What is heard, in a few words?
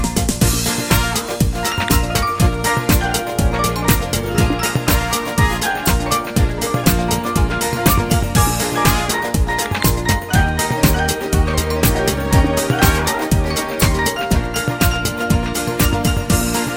Latin Club